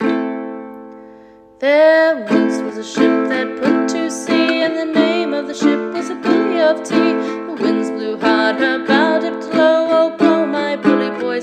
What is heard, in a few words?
Uke Wellerman; Uke book